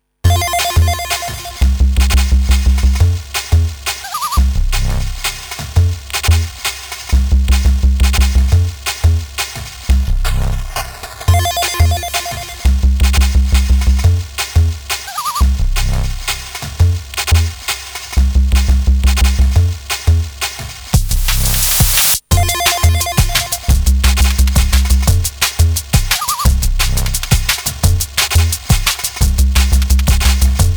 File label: jungle sketch; lsdj nanoloop2; weeklybeats 2026